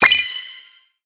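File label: Sound Effect System Gauge Complete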